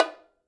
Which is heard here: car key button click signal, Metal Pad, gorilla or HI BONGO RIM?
HI BONGO RIM